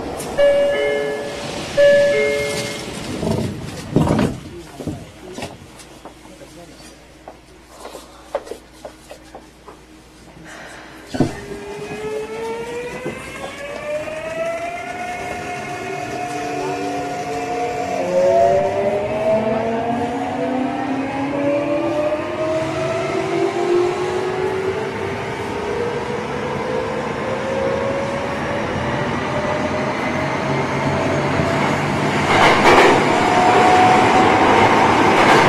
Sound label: vvvf, mitsubishi; eidan